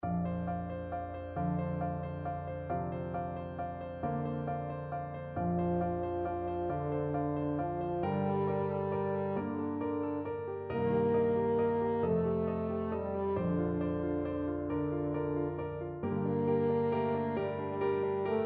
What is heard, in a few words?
evensong, french horn; lehmann